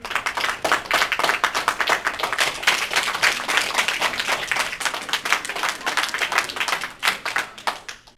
clapping Longer, group Of People

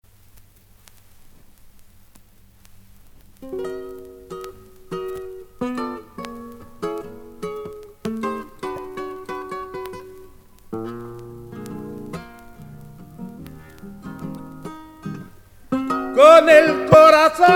Guit Voice, Zamba

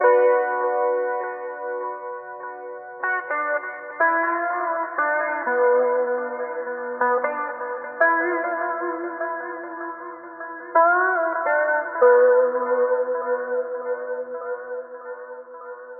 Blues Dreamy Lead Guitar Bm 60

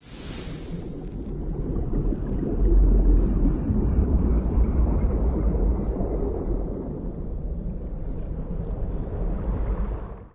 Aperiodic Waves Stereo

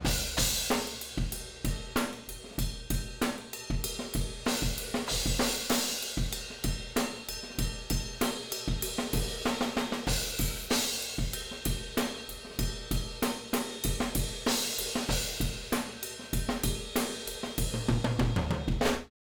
Overheads

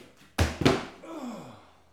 trip Oof, falling

